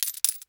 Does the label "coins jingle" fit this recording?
Yes